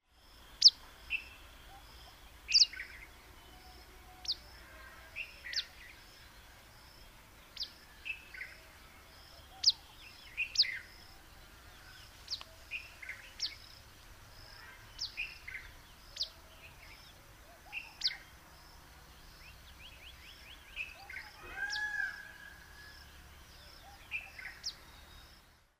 White bellied Minivet Pericrocotus erythropygius, white bellied minivet call